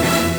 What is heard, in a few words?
Orchestra Hit, Genesis; Sword of Vermilion; M8